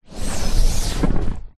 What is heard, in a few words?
boat rubber dinghy small drag pull rub hard ground